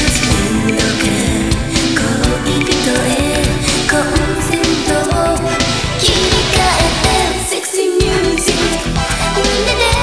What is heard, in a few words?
sexy music